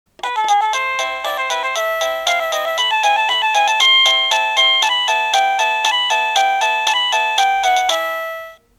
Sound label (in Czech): melodie